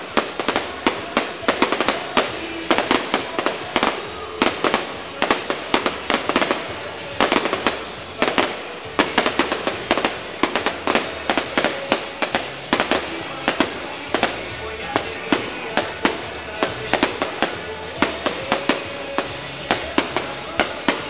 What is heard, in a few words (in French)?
feu artifice; bagniere bigorre